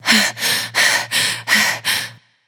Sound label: breath; eset